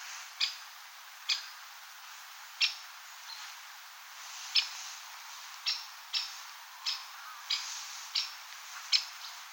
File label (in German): Vogel; Frank Roos; xeno-canto